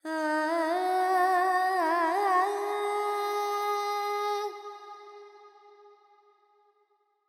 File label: FX, Vocals, Carnatic Female, Alaps, Charukesi